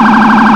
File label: emv priority, sirens federal; federal sig rumbler